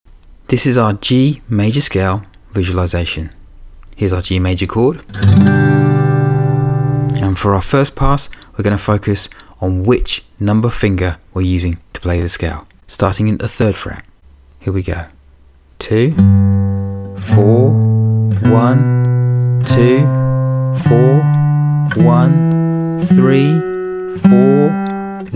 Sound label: g major scale; visualisation